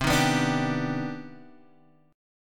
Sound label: C Minor Major 9th C